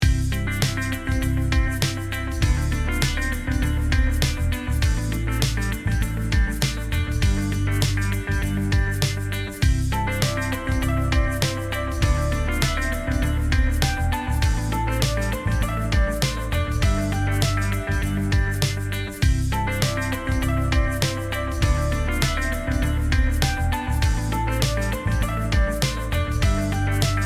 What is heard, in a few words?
api 5500 api2500 普通